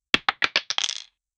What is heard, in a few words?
dice landing sound cartoo